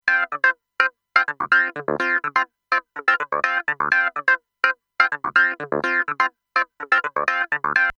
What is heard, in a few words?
Sonic Farm Xcalibur JC Clavinet Original